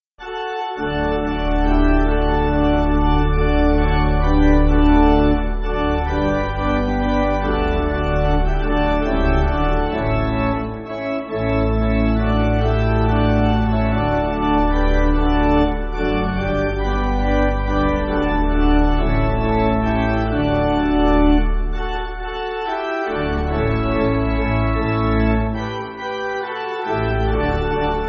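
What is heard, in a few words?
Pipe, Pipe Organ, Music Backing; Russian Composer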